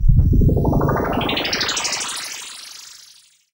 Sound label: FX Dance; Sound & Vision - Gigapack II